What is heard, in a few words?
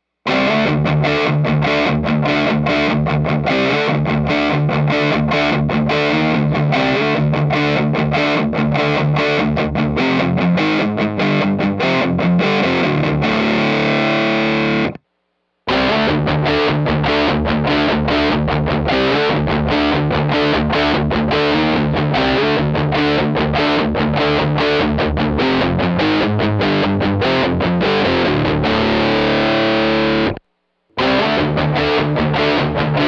metal